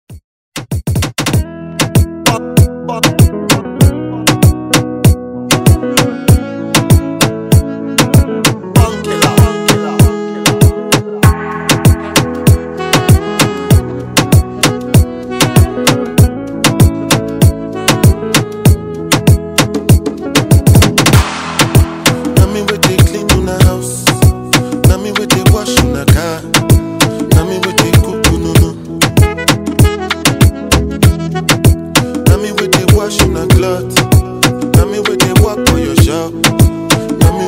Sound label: Moombahton Afro Redrum